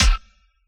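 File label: snare drum brutal, Yamaha DJX; 155 DJX Kit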